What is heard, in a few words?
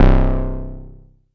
mbrute syn, Arturia MiniBrute